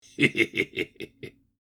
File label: Elderly man giggles and chuckles; laugh